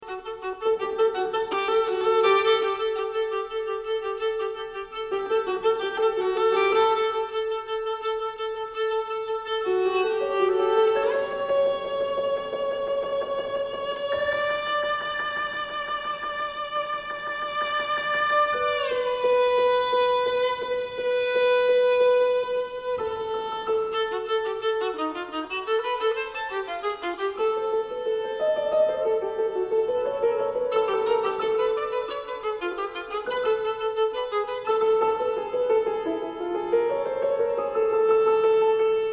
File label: Sonata violin and piano